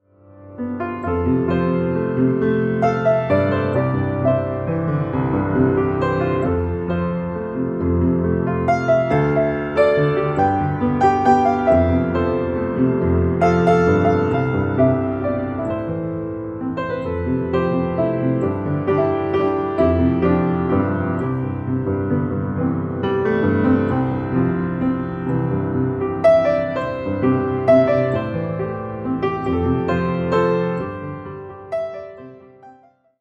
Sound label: Winter Waltz